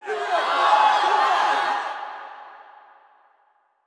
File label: Add Points; World Of Sport Quiz